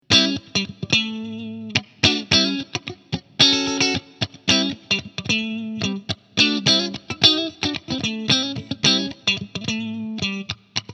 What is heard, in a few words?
Boss IR 200 Twin Reverb